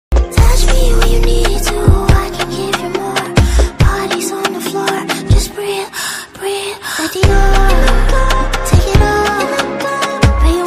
ringtone english ringtone

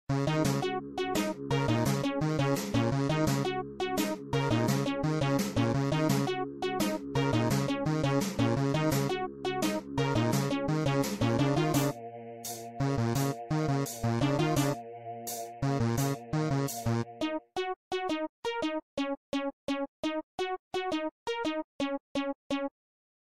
simply beep bgm

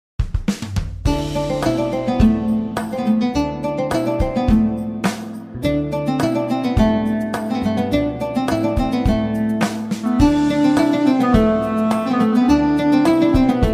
arabic ringtone instrumental; eid ul fittar; eid mubarak

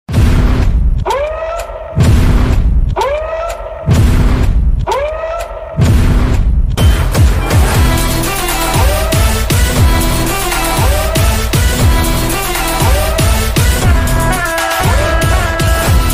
entry bgm; waltair veerayya

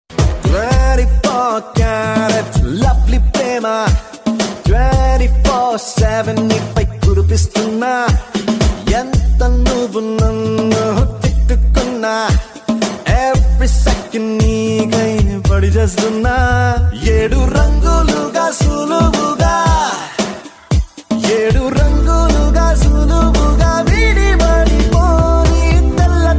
movie ringtone

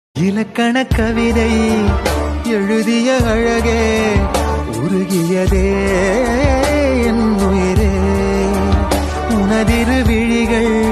tamil bgm ringtone

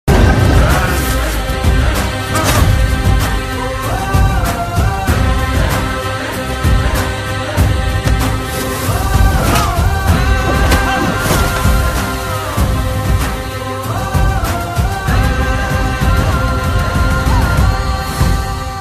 movie bgm ringtone; acharya; chiranjeevi

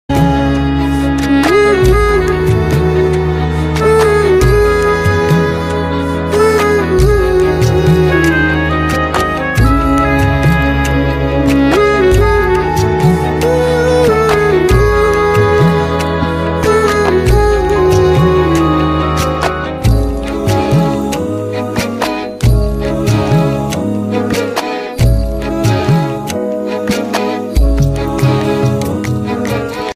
sad bgm ringtone

